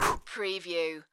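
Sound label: Human Blow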